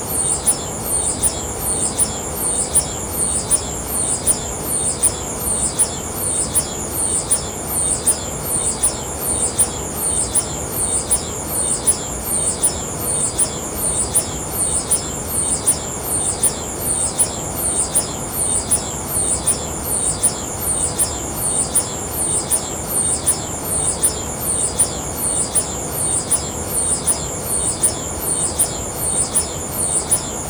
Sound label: paranal ut1 ambient; soundscape